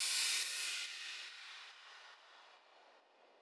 drum Loop 140BPM FX Down Lifter

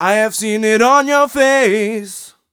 m 127 mel a, Vocals

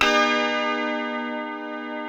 A 3 FUNK CHORDS, Guitars; Spectrasonics; Hans Zimmer Guitars VOL-1

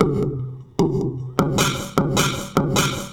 Throatbeat, Industrial, Beats; Sonic Foundry (Sony Creative Software)

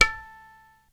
TABLA; Crypton - DrumBase5000

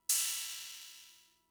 Cymbal; Fricke MFB-522

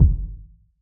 pop kit kick

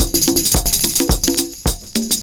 PERCS, 70 s Breakbeats

